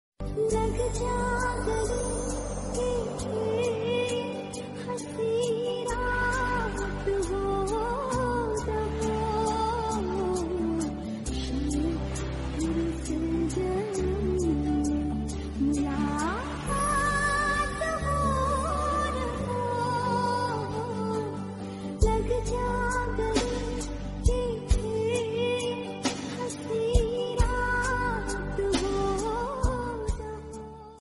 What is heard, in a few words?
female version